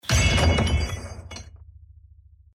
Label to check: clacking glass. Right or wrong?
Right